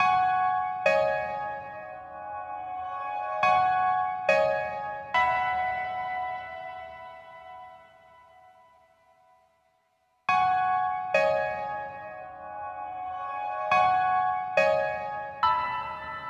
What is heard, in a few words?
bgm home; Meitantei Pikachu Shin Konbi Tanjo